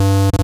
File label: bass G1